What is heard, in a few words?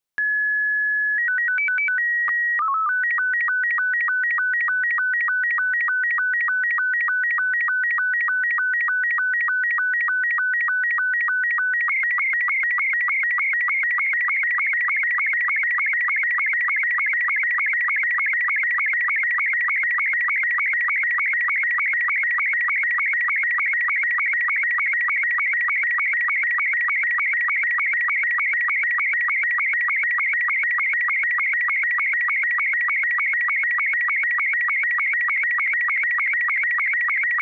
SSTV Picture